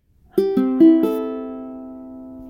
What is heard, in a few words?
ukulele in tune